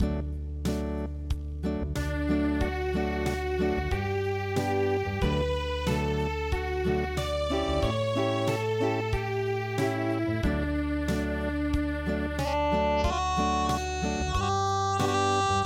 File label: Acoustic